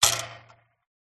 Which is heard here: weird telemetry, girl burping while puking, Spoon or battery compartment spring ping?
battery compartment spring ping